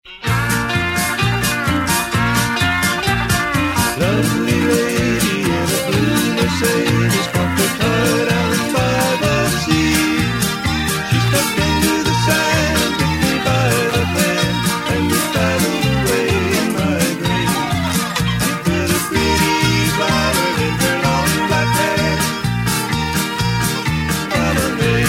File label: music